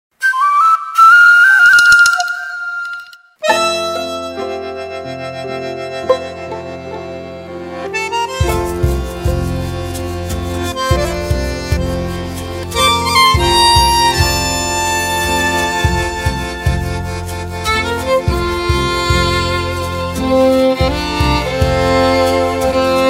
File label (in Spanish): Galopa Gualambao Instrumental Violin